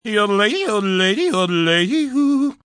Yodel Sweet, Yodelling, male, sung, short & sweet (human voice)